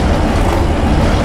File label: machinery; Golden Crayon Sounds